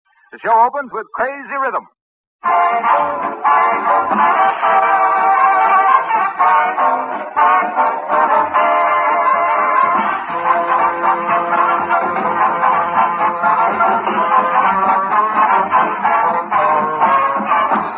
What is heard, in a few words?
orchestra